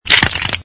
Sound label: snap